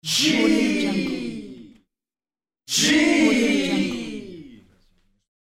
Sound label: male gees in unison; human sounds; هماهنگی چند مرد